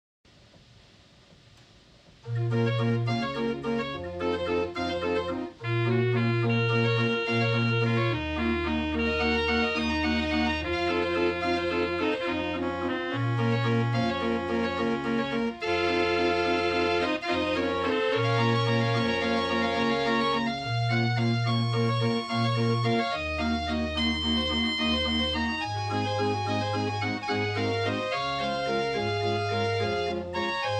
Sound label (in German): Standchen, Limonaire Kermisorgel; 59 Toets